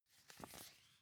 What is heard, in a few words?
magazine flip page